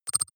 click stutter soft; maschinen; signale; soundpool

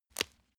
step leaves branch crack short; schritte

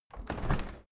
window handle open; objekte; fenster

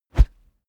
whoosh wood cornered whizz bass

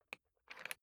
briefcase plastic latch lock key turn; industrie wirtschaft; bueroarbeit

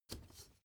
step ladder wood grip single

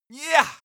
male attack, schreie

